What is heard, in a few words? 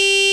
horn; cstrike; next21 kart